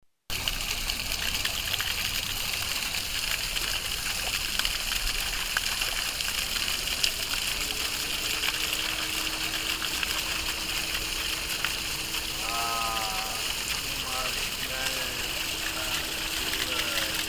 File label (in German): Trinkbrunnen, Graz